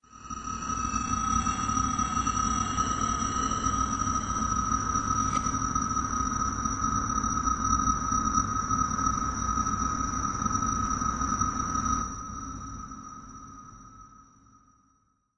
alien territory, Atmospherics

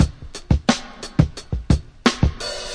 simple n snappy, drum loops and breaks, 087 bpm

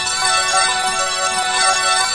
bell melody space, synth

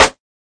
Snares

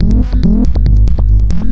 140 bpm hexbeat

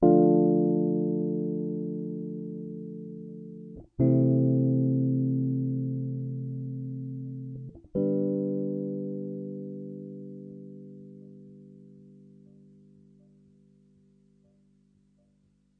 061 melancholy epiano chords; KEYS ORGAN PIANO LOOPS

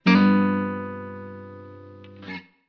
sarcastic guitar note

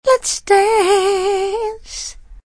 lets dance, FEMALE SINGING; VOCALS and SPOKEN WORD

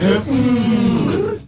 heh ooom, voice

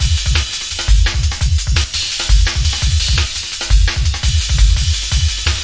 170 mad drummer, drum loops and breaks; 161 to 180 bpm

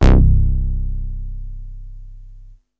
two freq, Basses

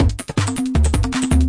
160 electro conga jungle; 141 to 160 bpm

